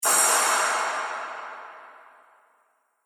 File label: Dramatic Movie Drop; Sci Fi Sound Effects